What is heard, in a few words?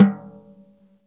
snare - snares off; JazzFunkKit